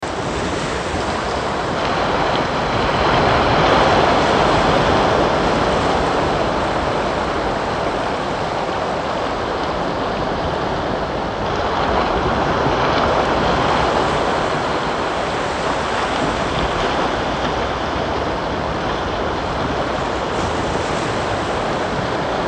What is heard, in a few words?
Romantic Piano Music, Easy Listening Piano